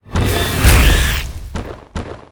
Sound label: Fairy Dragon atk